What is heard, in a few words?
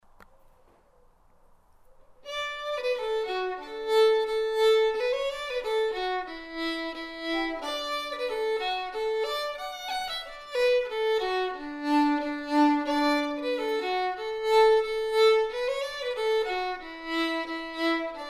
Polka, fiddle; Gullane Polka